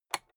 rotary control single turn

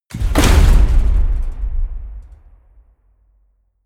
metal crash large

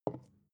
bottle put down light

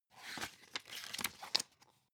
magazine open

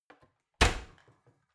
oven close squeaky

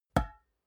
glass door small hit damped soft

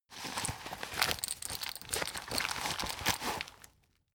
plastic bag handle aggressive

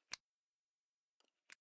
zippo close short

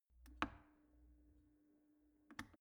plastic water boiler turn on off soft

